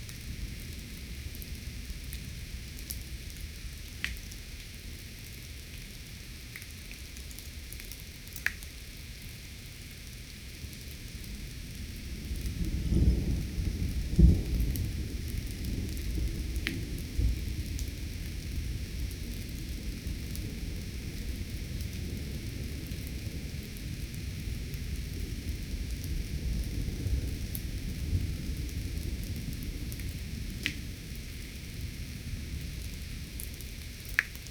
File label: soft diffused drizzle, binaural